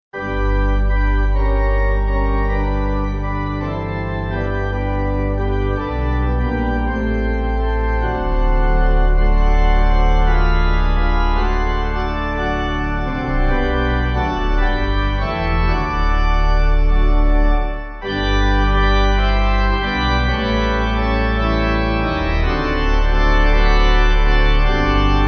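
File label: Pipe, Pipe Organ backing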